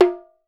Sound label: WOOD TAMB; BRAZIL SET; Voice Crystal - Steve Reid Definitive Percussion